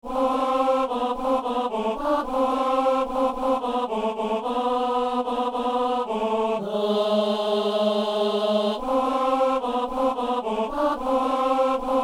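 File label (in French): ténor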